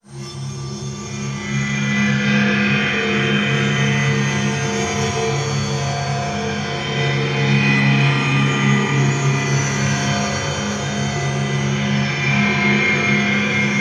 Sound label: sound design texture soundscape horror scene; sound effects one